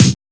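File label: BD, bass drum; X-Static Goldmine 1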